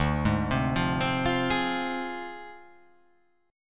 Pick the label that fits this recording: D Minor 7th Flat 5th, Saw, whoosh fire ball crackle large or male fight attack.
D Minor 7th Flat 5th